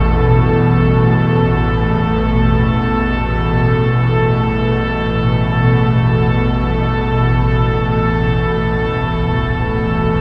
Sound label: DEEP STRING